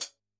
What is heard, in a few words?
Pd Hat, drum